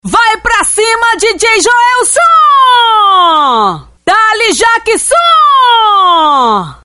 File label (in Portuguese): vinheta; vinhetas gritadas